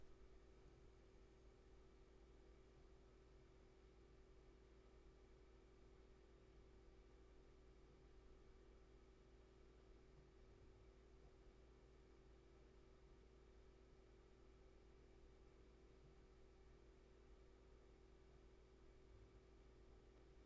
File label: roomtone